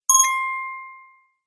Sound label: Wink Dink